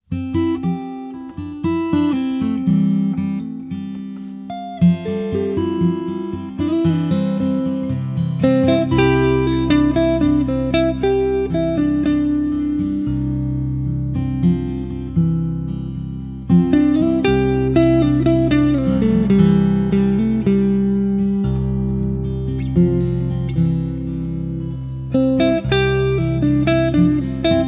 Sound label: - Karaoke